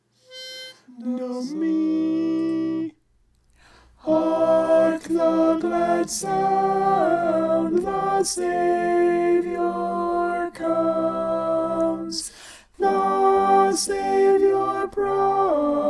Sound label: hark the glad sound alto